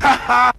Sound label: laugh